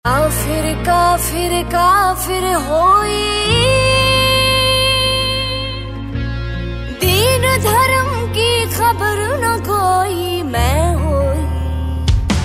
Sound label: pakistani ringtone